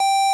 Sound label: pico G4